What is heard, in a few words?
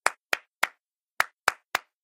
Clap